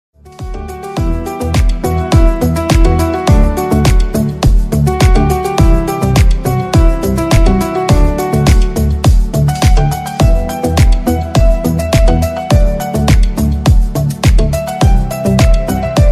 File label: Instrumental Ringtone Popular Instrumental Ringtone Sad Music Tone